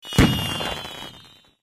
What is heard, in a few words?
firecrackers